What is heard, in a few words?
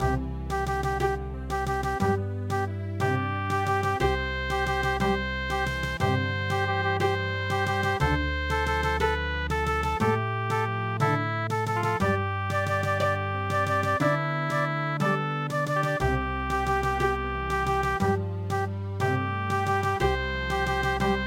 Bolero